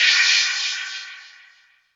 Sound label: Cymbals, Elektron MachineDrum SPS1 MKII